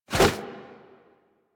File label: Arm Swing Foley; Enemies; Warframe; Purgatory Warrior